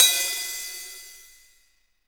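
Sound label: hi-hat latin; Roland L-CDX-01; HH Modules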